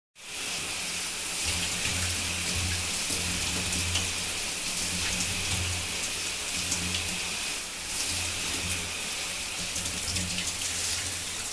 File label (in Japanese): ohuro